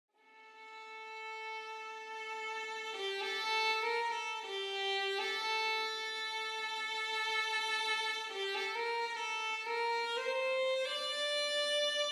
Solo Violin Modern Mix; Nucleus

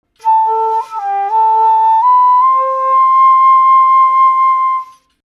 Flute Less Breath

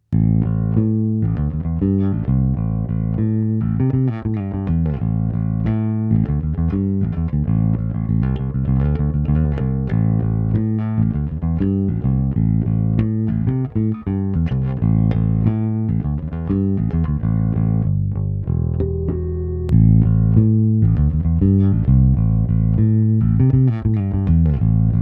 EBS Classic 500 Contour off on

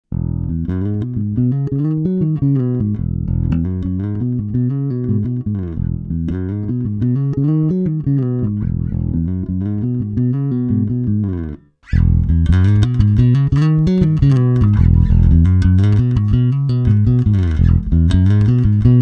slidy passiv Aktiv

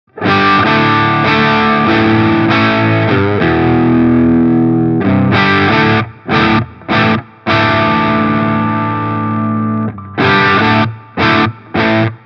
Fuzz Mode; Beetronix Nectar; Honey 09h

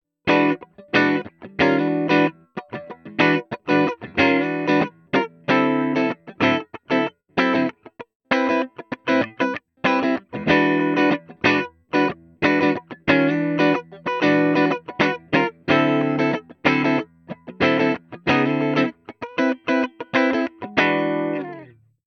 Mooer Preamp Model X Clean

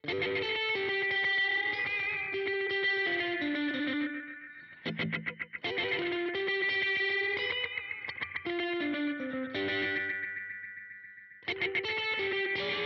Way Huge Supa Puss Gain

